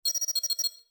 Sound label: Beep; Star Trek